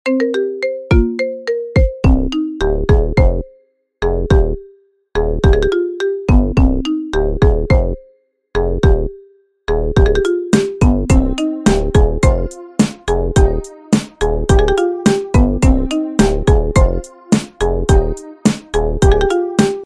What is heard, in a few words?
Marimba Instrument; iphone